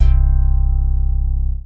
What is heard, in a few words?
cheesy synth; single samples